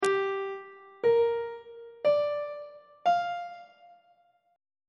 b sharp minor 7th chord 5 is f double sharp minor 7th on treble clef